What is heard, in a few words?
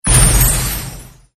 pigbank break; moneybank